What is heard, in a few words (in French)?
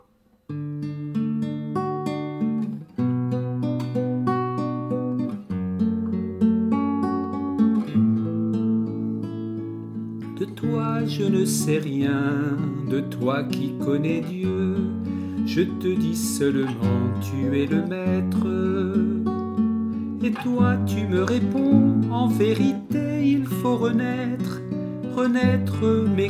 Hymne; Hymne de Nicodème